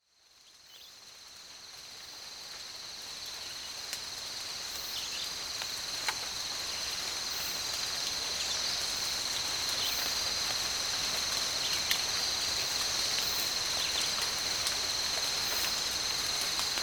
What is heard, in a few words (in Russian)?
zvuki prirody; ravnovesie